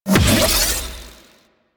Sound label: Dust Energy Restore; Warframe; Warframes; Fairy; Augments